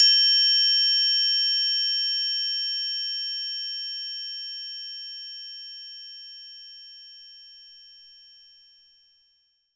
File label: Open Triangl, Roland JV-2080